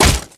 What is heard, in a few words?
step run